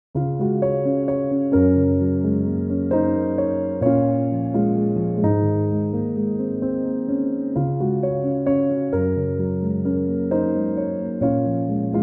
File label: piano instrumental